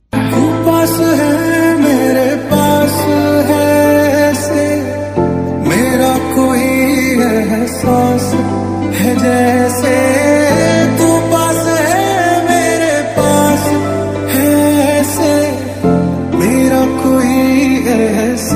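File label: song ringtone background music ringtone; ahaan panday; aneet padda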